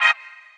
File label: Eb STAB, funky